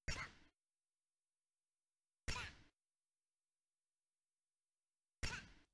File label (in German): charakter schlag multiplayer; battleblock theater